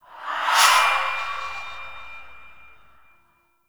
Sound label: Smithy Soul Breath; Soul Plane